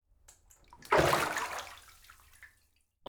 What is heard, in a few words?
bath splash